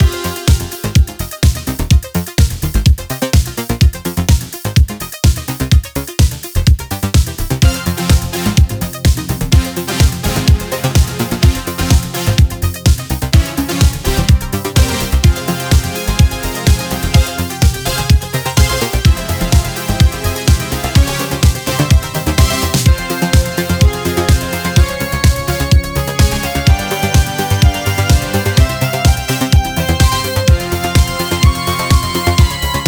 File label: Deep Sea Bass (Coral Riff Remix); Crypt of the Necrodancer